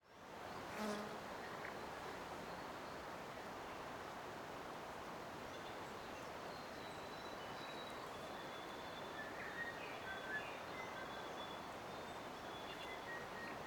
Bush Dawn Chorus Tree Wind Dawn Birds Breeze Distant River Falling Leaves, Wainuiamata, New Zealand; Remutaka MKH30 50; Trent Williams